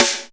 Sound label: HOUSE SNRE; housey drms